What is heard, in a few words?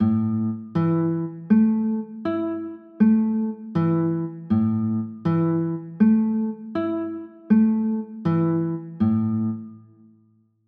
a 6, chords